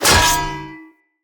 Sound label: Great Sword Impact Block Defender; Weapon Impacts; Combat